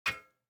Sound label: DICE on Metal, Grab, Standard, One Die; Samples; ArticulatedSounds; DICE Sfx Party (Extended)